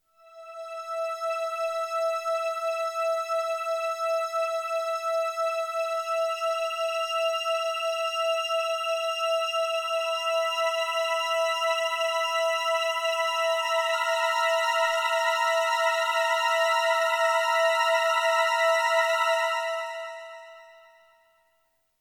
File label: Classic Horror Movie Scene Music